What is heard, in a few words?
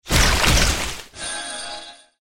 attack; hero sound